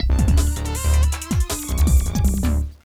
Received Errand

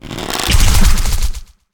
Archer Multiple Shoot; Inotia 3

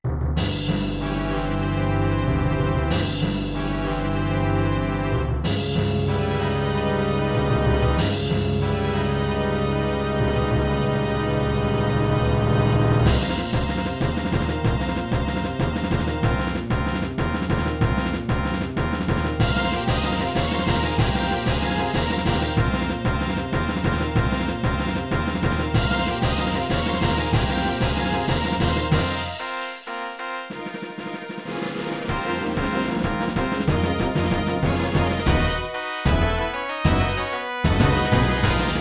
Boss (without trumpets); Star Fox 64; n64

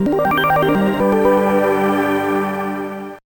Unlocked; the urbz sims in the city; gba; Time Travel Machine

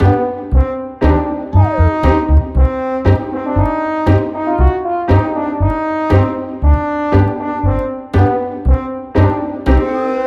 Clumsy Silly Loop; eternal radiance ps4 switch windows gamerip 2020

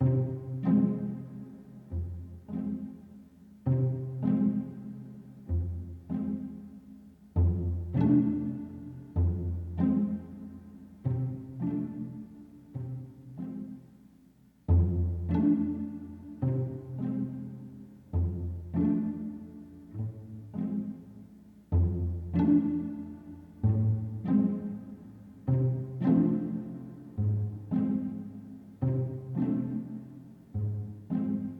Sniper Loop Slow; Hitman Blood Money

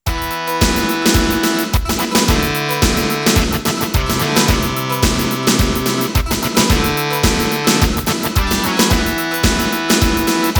1 Coin (SC-55); Fatal Fury 2; x68000

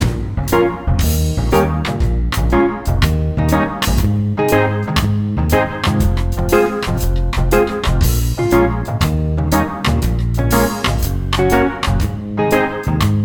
Reggae; MU SPKR Reggae 02

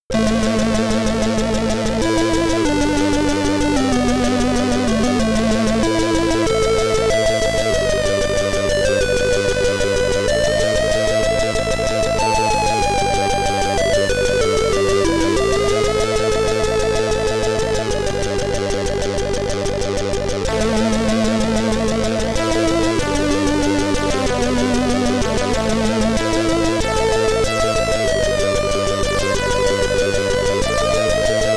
BGM, SID Stereo, commodore 64; Black Tiger

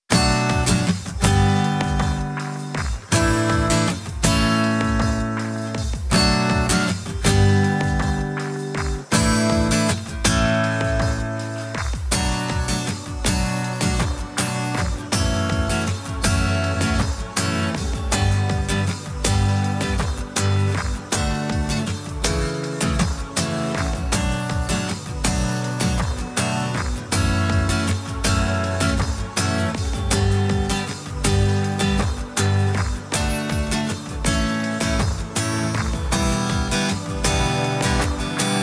Short Version (without vocals); Girl Cafe Gun

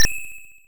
Coin; puzznic taito l system arcade

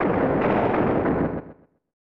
Boom!; Kablooey; SNES

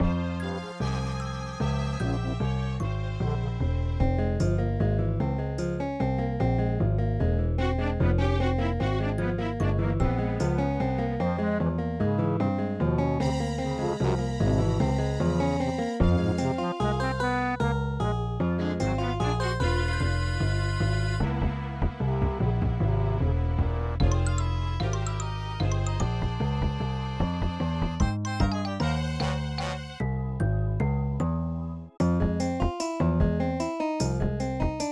Waltz; Blazing Dragons; PSX

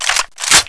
reload A R Chamber

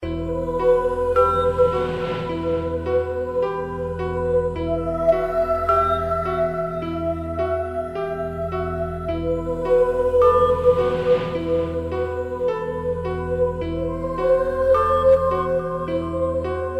Loop

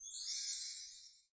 Panel Ruby Sparkle; Among Us

